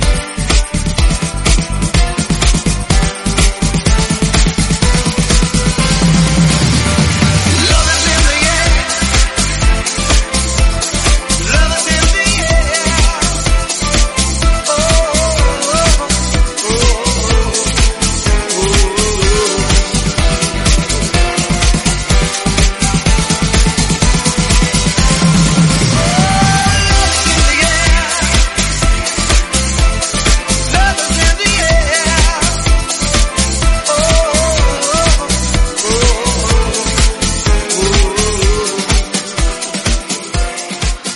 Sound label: Disco 125bpm C